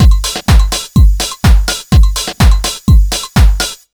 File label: 125BEAT, Disco House; USB Soundscan vol.20; Fresh Disco House I; AKAI